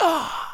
Player Hit

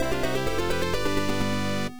Treasure Chest; popful mail; pc 9801

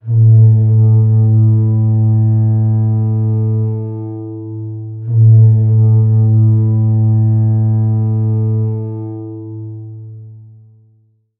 War Horn; Heroine Anthem Zero 2 Scars of Memories

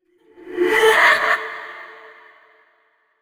laugh short; yamiko

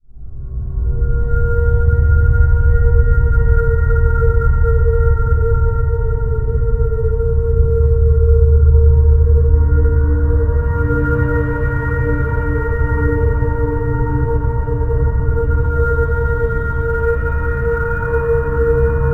environment graveyard stinger metallic

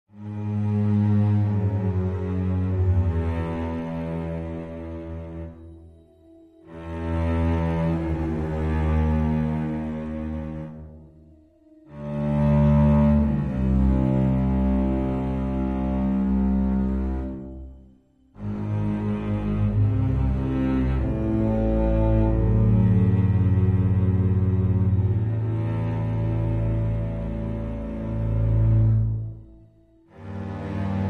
Tense

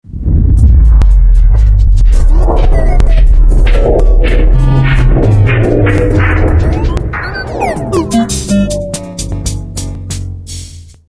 shockwave; Strong Bad's Cool Game for Attractive People

